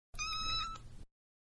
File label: Cat-Meow; Cats; Animals; Cat-Meow 5